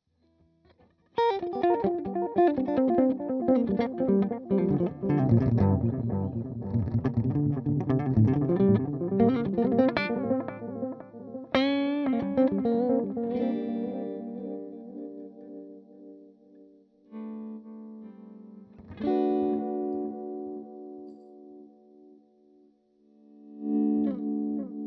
Delay

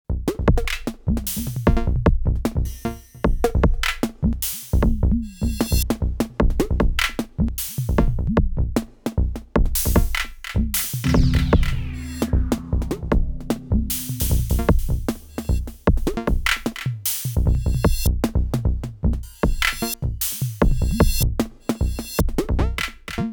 Roland TR 8S Pattern Extra; Ableton

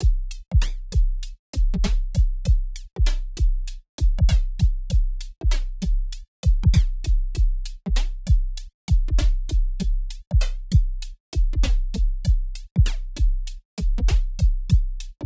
Eventide H9000 Instant Flanger

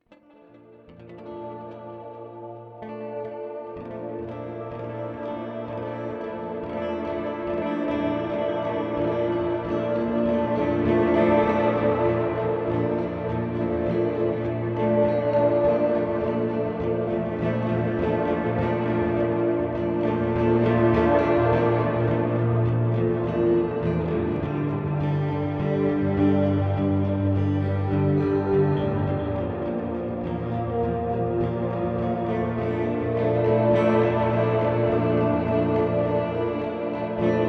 Delay Reverb Modulation; UAFX

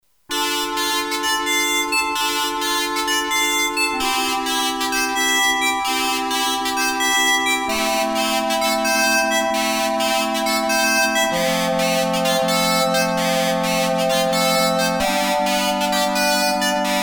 Triangle Pad

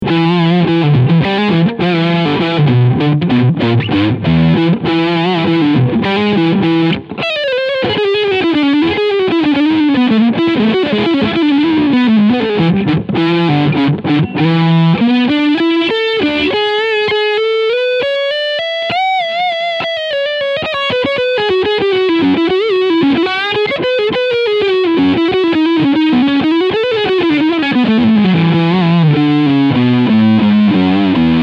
Ibanez PWM20 Overdrive Lead Front Pickup

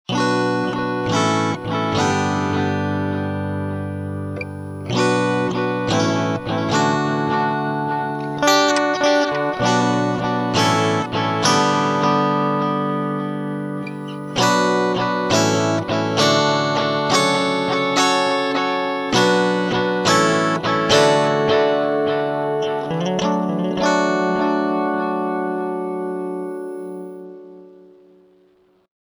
DL 5 Medium Delay

